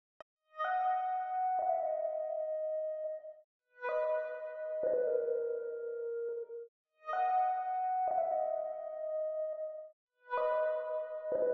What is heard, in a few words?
148 BPM G# Min Lead; Stems; Cymatics; Good Vibes